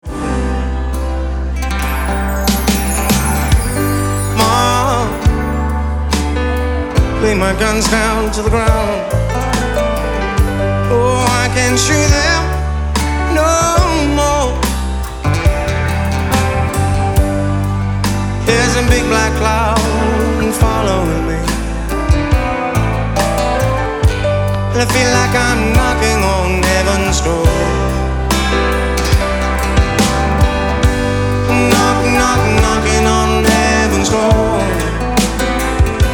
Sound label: Live Mix